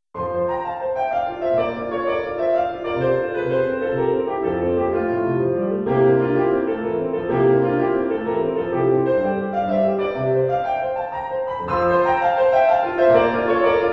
etude pour piano

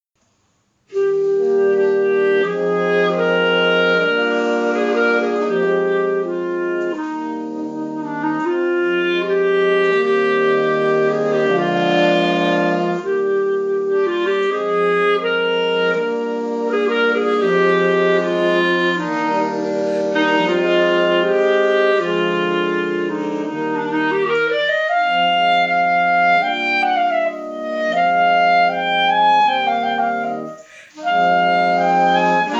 arrangement jazz; ode a la joie

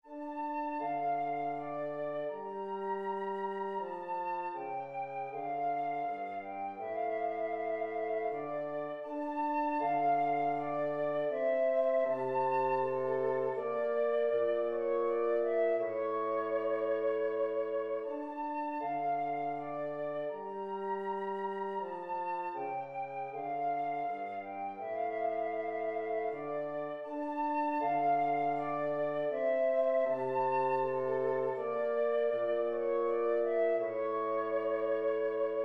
sarabande mineur

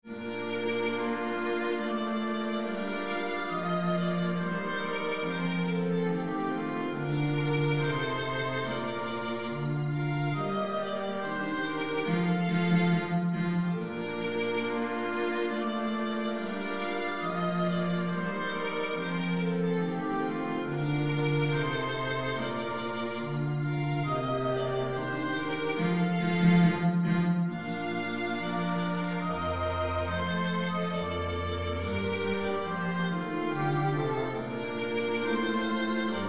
hymne national espagnol

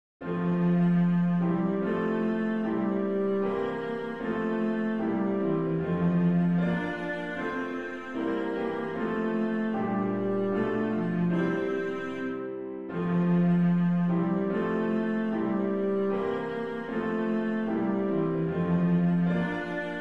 hymne national allemand; haydn joseph

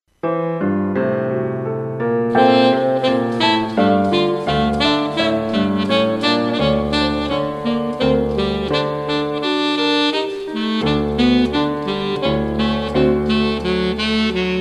fugue en fa majeur

